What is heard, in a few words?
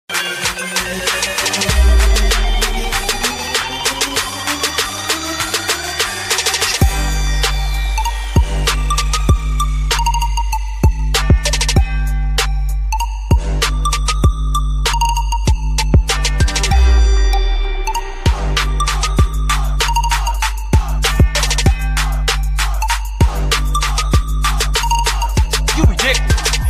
theme song remix; GTA San Andreas